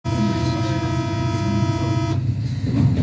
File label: subway